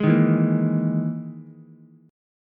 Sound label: E Major 7th Flat 5th, Ukulele; EM7b5 strummed; JGuitar